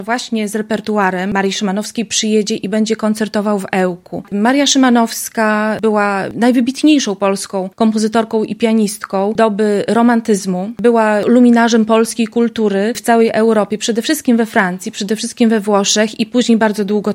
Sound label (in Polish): fortepian